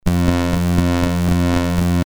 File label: dpcm